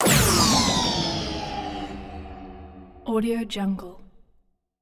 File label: card game effect slow down bubble